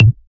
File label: Percussive